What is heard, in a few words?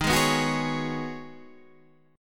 Db 7th Suspended 2nd Suspended 4th